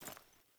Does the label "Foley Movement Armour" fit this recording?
Yes